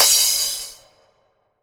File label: Crash, Cymbals